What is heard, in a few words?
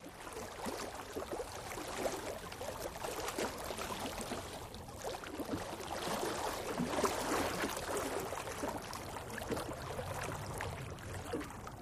Water Mvmt Looped Sha, waves & surf bg